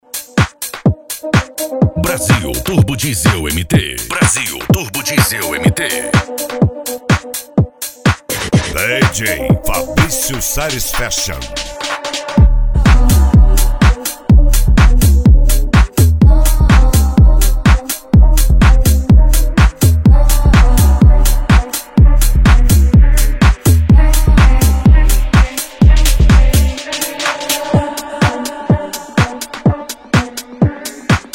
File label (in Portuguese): deep e funk antigo